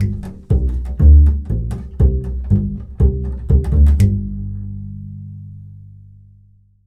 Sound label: Bull Fiddle, Appalachian; Continental Drift Aftershocks